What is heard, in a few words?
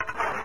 bow reload; Soldat